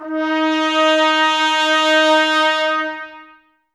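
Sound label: BRS trumpets D#; 90 s Sample CDs; Miroslav Vitous; Woodwind Brass Ensemble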